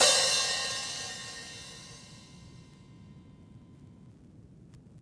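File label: Vinyl Open Hat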